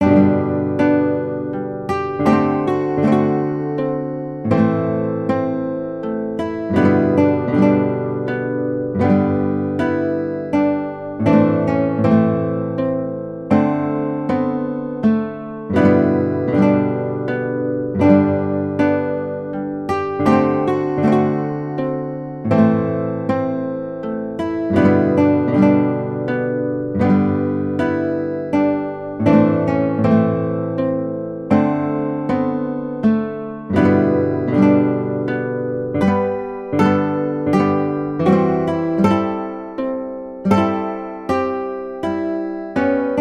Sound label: Guitar